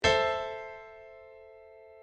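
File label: piano chord A minor; circle of fifths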